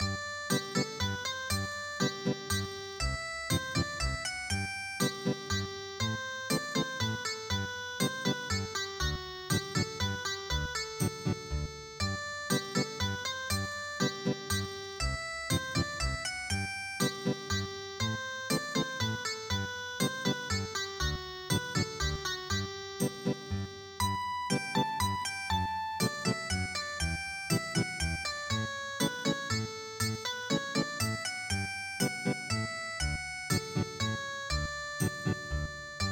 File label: minuet Ethnic Guitar